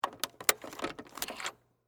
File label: Hotel Door Key Card, Insert, Unlock; Foley; Miscellaneous Door Sound Effects